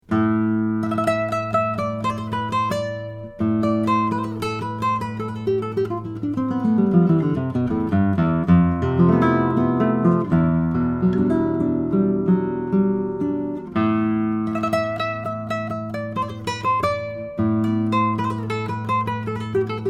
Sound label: classical guitar; chantus; william king